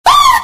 catch virus; ICTS Denmark

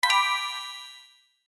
Button Tap; RunnerSanta2019; UltimateKit

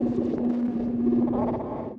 score Summary; ICTS Denmark

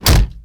Player Crash; RunnerSanta2019

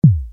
count down tick; ICTS Denmark

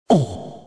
catch neutral; ICTS Denmark

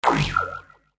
catch virus; ICTS Denmark

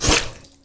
Bloody Swipe Cut; Santa 2018

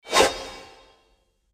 Sword Swoosh; Santa 2018

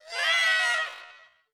catch virus; ICTS Denmark

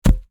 Character Jump Land; RunnerSanta2019; UltimateKit

